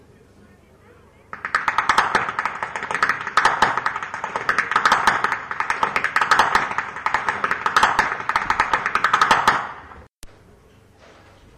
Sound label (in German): schnalzen; berchtolding; saaldorf